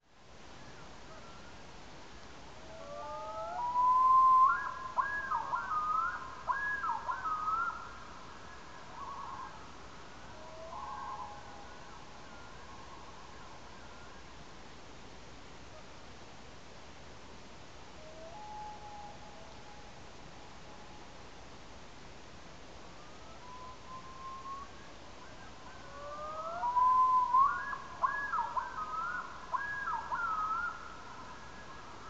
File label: Loon call